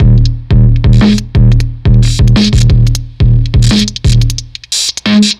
Fuzztest drum broadcast

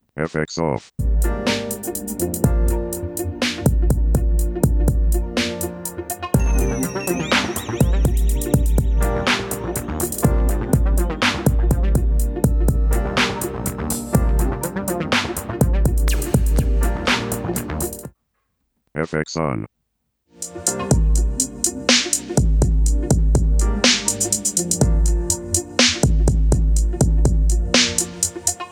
fatdrum bass; bitwig; akira tube; smaolab

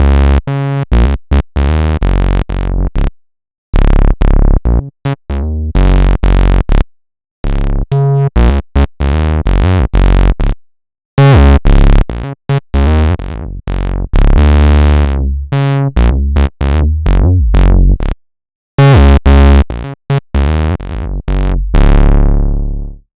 1 Osc Bass

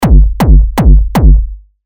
sub gate tail; vital

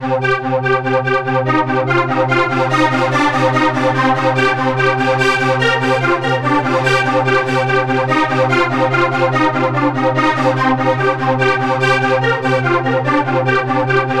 3 osc uni and global uni; firefly synth 2; sjoerdvankreel

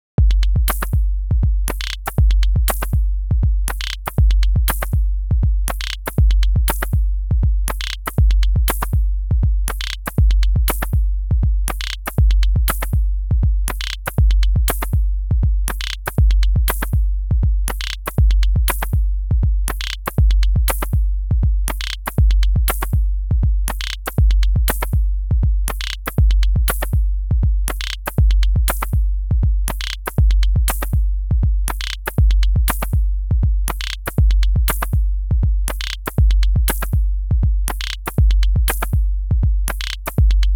Distressors

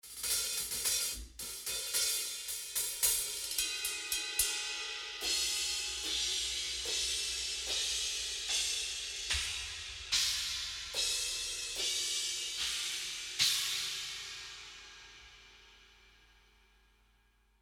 cymbals; helloween production kit; klangmacht

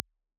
Sweep 96000 fade

